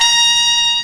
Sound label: TRUMPET